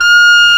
WND CLAR2, Clar Jazz; Roland LCDP04 Orchestral Winds; 90 s Sample CDs; Clarinets

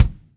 Bass Drum Kick; Sonic Foundry (Sony Creative Software); Joe Vitale Latin Percussion

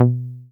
SYN 303 C2; Best Service Gigapack 1; SYNTB303

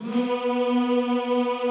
B2 R, Classical Choir; Best Service; Extended Classical Choir

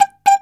CHINA, Heart of Asia; Spectrasonics; CHINAFLT

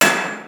TEKNO; Rubber Chicken Software Sample Bank

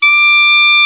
KLARINET, Alpine Volksmusik; Best Service

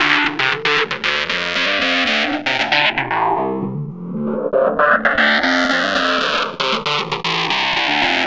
Distorted Bass, Electric Bass

